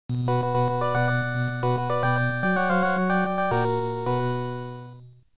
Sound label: game over